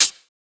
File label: hat crunchy, Closed Hat; Drum One Shots; SD MIDNIGHT Sample Pack; Slate